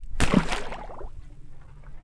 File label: Water; TOOLKIT vol.2